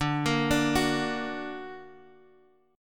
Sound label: D Augmented Guitar Half Step Down, chord